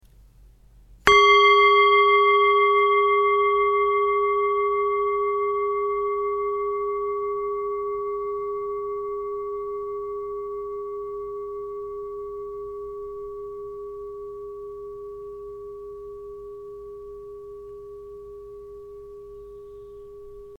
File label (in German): Klangschale